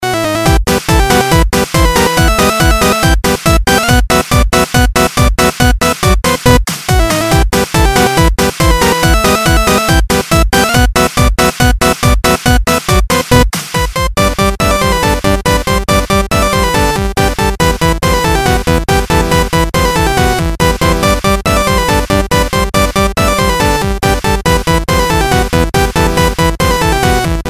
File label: Classical Techno